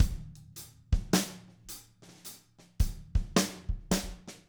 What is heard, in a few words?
107 SLOW, drum